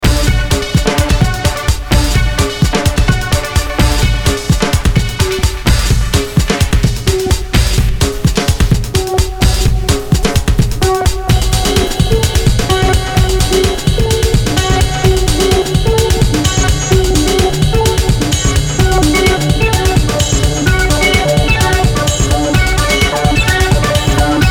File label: Hum Drum, Urban Sounscapes